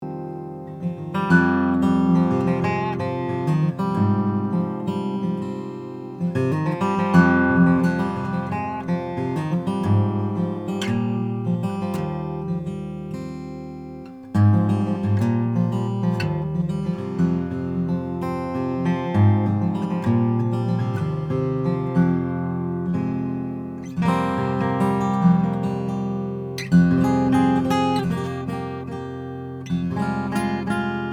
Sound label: greenfield g4 2 lt koa, Guitars Acoustic; Koa back & sides